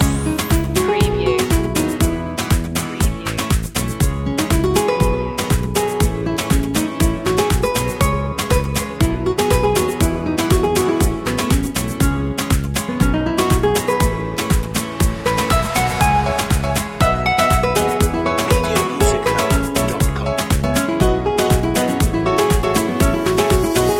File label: guitar loop; media music now